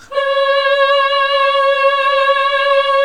HMM C#3, classic vox

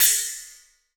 RESO CYN, Akai MPC2000

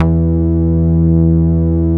MOOG E3MP; 90S MOOG

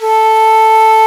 Shakuhachi A3; Planet Earth; Digital Sound Factory Planet Earth

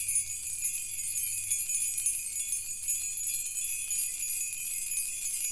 Abstract Key Loop

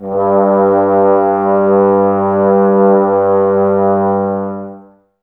BRS TBS G, Woodwind Brass Ensemble, Brass; Miroslav Vitous - Woodwind Brass Ensemble

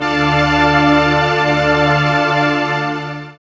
SYN DANCE; Sound & Vision - Gigapack II

